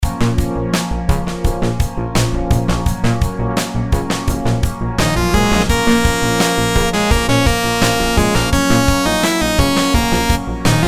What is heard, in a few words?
rhythms simple dotted 8s 85